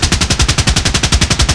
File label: apache 30mm gun outside loop